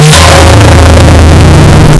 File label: metal vault door slam gla